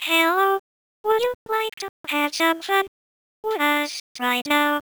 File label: Computer normal; Mr. Fun